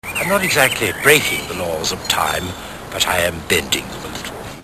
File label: bending